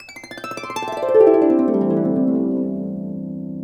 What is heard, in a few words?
gliss down, harp; InVision Interactive; Lightware VOL-1; Instruments & Percussions